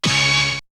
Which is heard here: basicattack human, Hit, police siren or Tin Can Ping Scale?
Hit